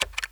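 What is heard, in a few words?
computer key; 1000fx; OFFICE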